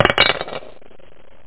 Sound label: drop; Shareware Games Galore!; arcade